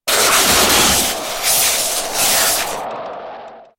Electric Vortex; Dota 2; Storm Spirit

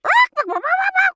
Chicken; Mage; Realm Royale

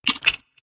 move pain; snapfire

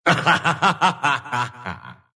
laugh, ru; Dota 2; Monkey

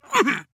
Demon Grunt Hit

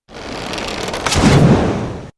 Bow Powershot; Dota 2; Sparrowhawk

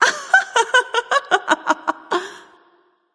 laugh; Heatsink Pele; Smite

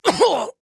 Grunt Hit; Paladins; Koga

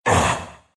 dragon pain, ru; Dota 2

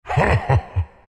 laugh; dota2

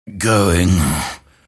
move pain; Dota 2; grimstroke

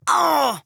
Grunt Hit; Paladins; Demon

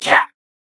Death; BombKing

